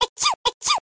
hatci

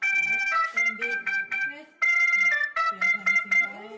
es krim keliling

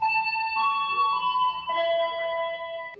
Bel Stasiun

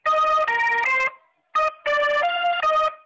sari roti end

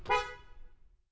klakson

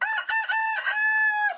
ayam kukuruyuk

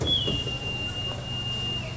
Peluit KA

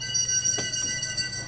Peluit KA

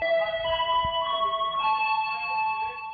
Bel Stasiun

